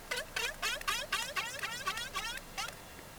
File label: Trumpet, whales and dolphins